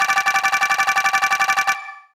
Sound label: ui gem Counter LONG